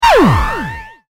Intense Laser Gunshot

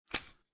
CD Jewel Case Open, Foley, CD Jewel Case Open; Click; Multimedia

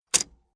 Old Tractor Handbreak Released Fast Single; Transport

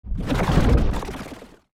Garbled Fly Over Whoosh; Whoosh Sound Effects; swish, sweep and swoop; Andrew Fleming

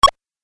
Retro Pong Tennis Hit; Video Game Sound Effects

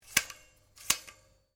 Rifle Remmington 700 7.62 mm Bipod Extend; Guns; A selection of domesttic firearms; Rifles

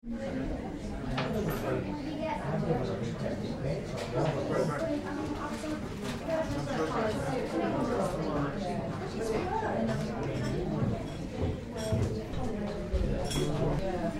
Tea Room Chatter, Mixed chatter interior; Cafeteria; Tom Hutchings

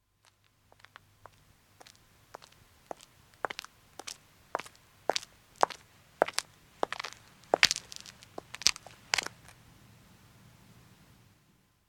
Footstep road walk, 1 woman approaches and stops; BBC

